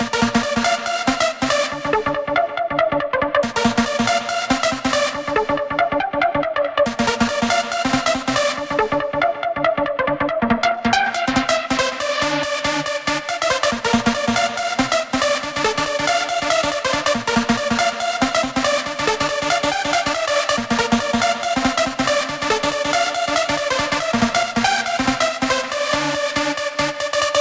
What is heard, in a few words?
140BPM D; Vengeance - Essential Clubsounds Vol.4